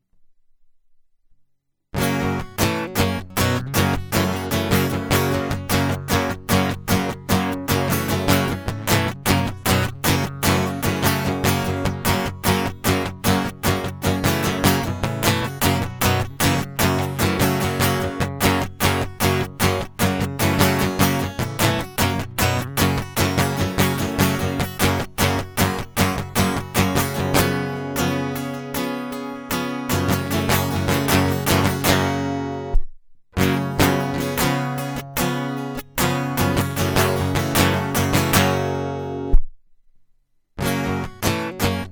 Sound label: Guitar Loop